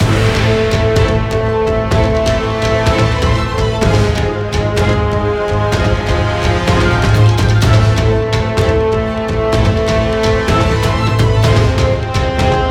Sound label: Boss Battle Loop; Stage-6-Boss1